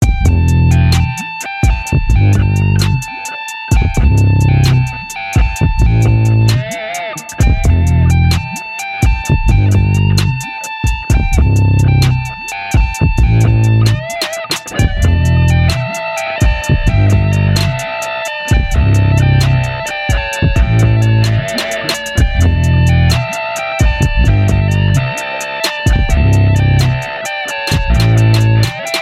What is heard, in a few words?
Loop Bace Drop E 65